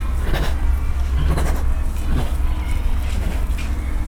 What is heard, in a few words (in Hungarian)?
szibériai tigris; szegedi zoo 2011